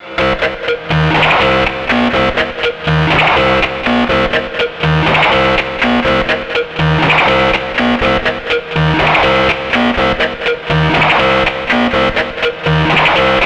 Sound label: C5, Synth Line; 90 s Sample CDs; NiceBeats; SynthLine VOL-13